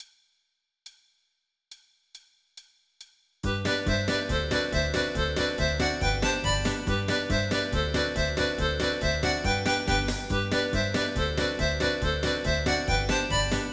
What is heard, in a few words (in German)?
Akkordeon Polka; SONGTEXTE VOL. 1; Midifiles